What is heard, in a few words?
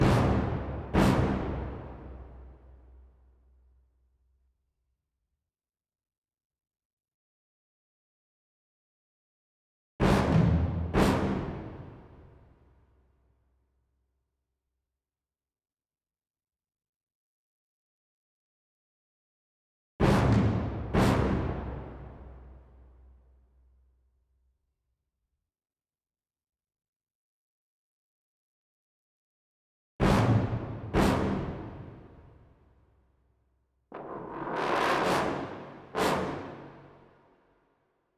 Cinematic Music - Tension; littlebigplanet karting ps3 gamerip 2012